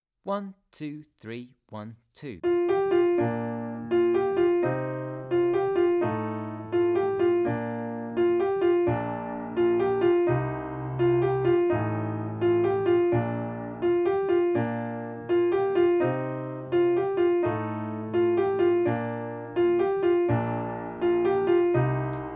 German Dance Playalong

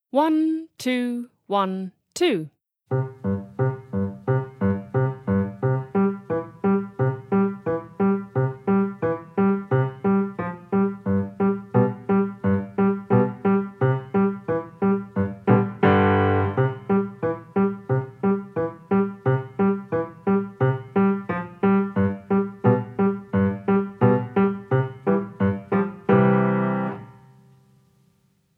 Anvil Polka Playalong

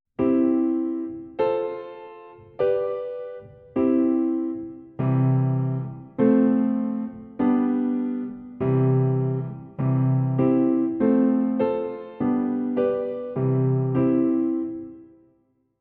Primary Triad Exercise

